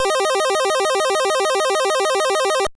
arpeggio minor 12 ticks

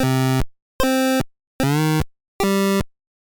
instrument pitch

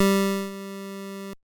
effect volume slide

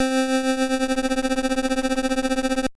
tremolo slide

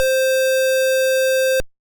waveform square 8

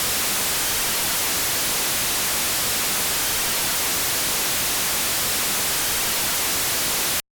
waveform noise ghost

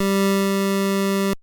effect panning slide

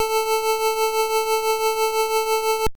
square wave with tremolo

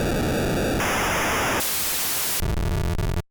waveform noise

